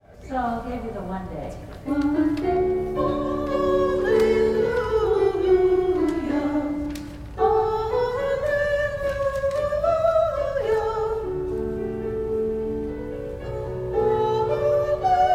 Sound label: soprano; How Lovely Is Thy Dwelling Place